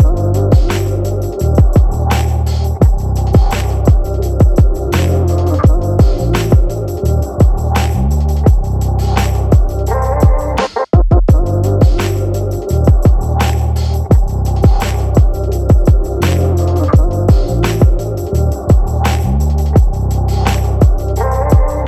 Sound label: Loop percu bass amb; design sonore